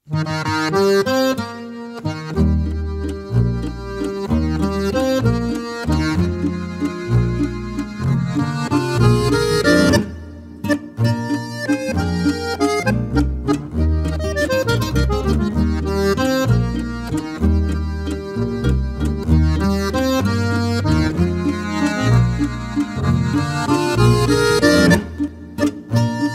Walzer

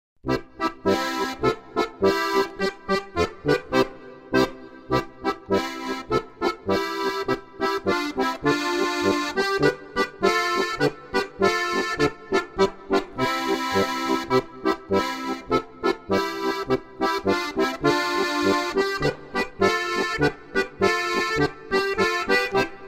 Sternpolka

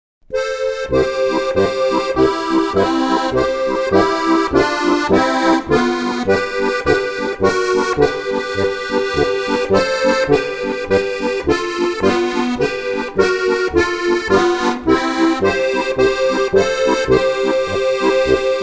harmonika

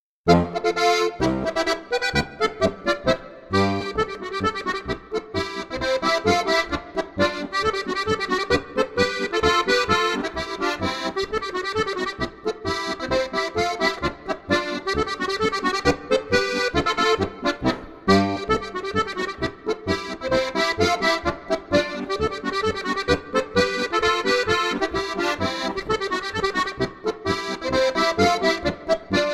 marsch

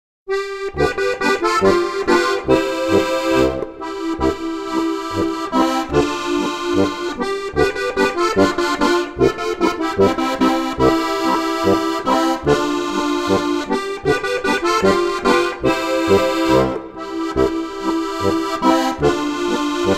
harmonika

